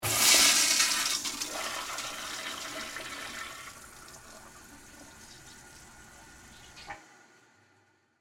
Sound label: Toilet Flush Bathroom, Office, Toilet, Flush, Public, Bathroom; Public Bathrooms